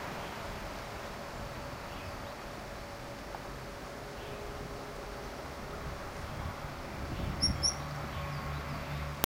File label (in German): Kohlmeise